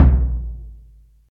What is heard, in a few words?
low tom sample c key, Royality free tom single hit tuned to the C note. Loudest frequency: 124Hz